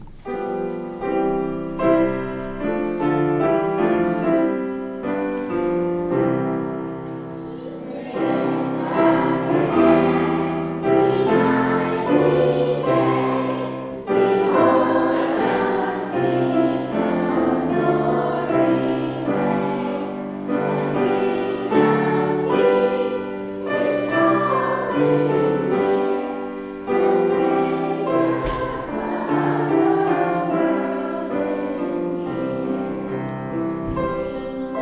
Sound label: Children's Choir